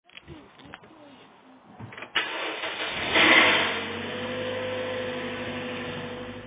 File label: toyota corolla 2010 start up rattle sound